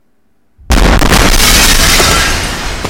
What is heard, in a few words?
airstrike near journalists hotel in Gaza